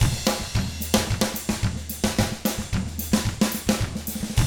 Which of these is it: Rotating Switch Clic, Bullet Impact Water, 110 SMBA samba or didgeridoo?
110 SMBA samba